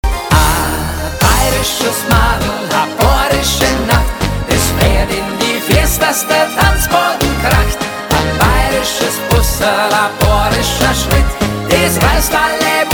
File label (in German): polka Walzer Medley